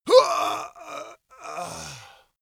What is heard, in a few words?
male death weak; schreie; menschen; soundpool